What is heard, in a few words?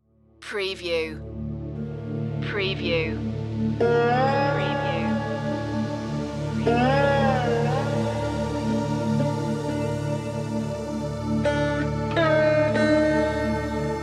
sarod and flute raag bhairav